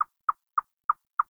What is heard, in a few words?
Forest Open Glade Chipmunk; Ambience; Day